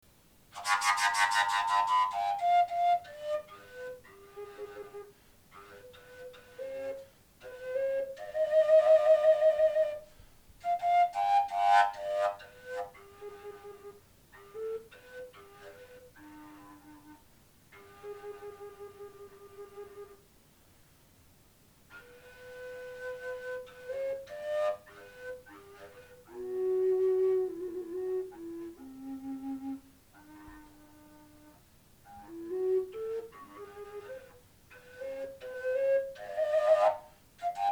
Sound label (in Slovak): fujara G